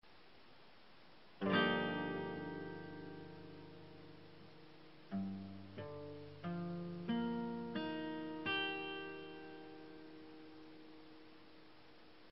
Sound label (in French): Accord Cm7